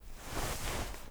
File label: FABRIC Movement Short; mono; Universal Sound FX; CLOTHING